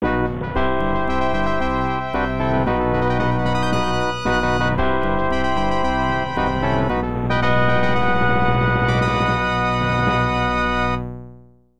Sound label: Title Fanfare; the king of dragons arcade